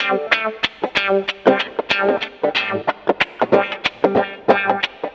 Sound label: ELECTRO HIP-HOP